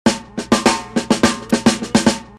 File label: prophet fill